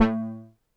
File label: Deep Bass a2, Korg EX-800